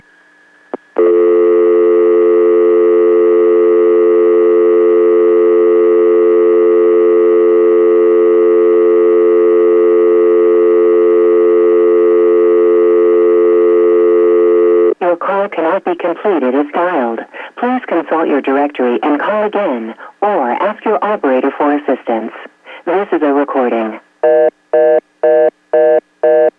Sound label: Off Hook Phone; Patch